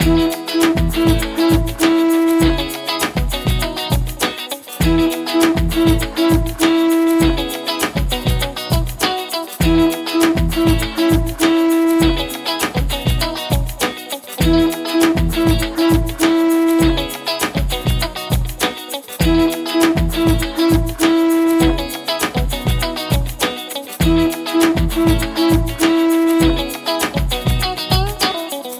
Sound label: Conch Songs Pop Mix